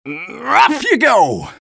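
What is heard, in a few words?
engineer taunt flip exert; vo; taunts; tf2; engy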